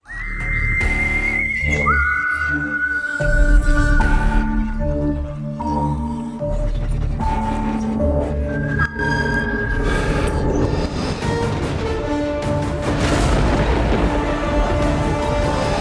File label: whistle BGM; Eesho; Malayalam